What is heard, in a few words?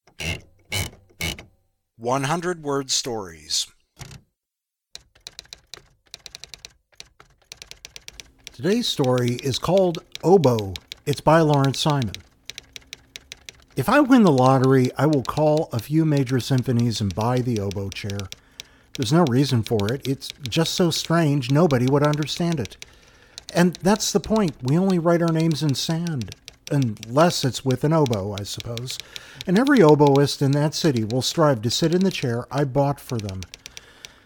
oboe